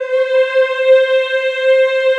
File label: CHO C4, Eeh; Eeh + Ehh